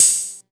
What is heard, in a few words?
Open Hihats, Cymbals; Vengeance Samples